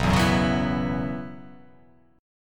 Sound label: B Suspended 2nd Suspended 4th down; strummed; JGuitar